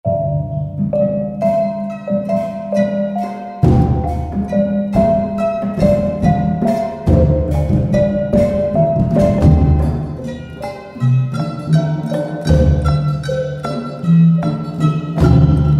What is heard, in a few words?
Malaysian Orchestra Phrases; Spectrasonics Heart Of Asia